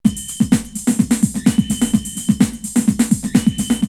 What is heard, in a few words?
Rave Signal 127, drumloops Techno&House; X-Static Goldmine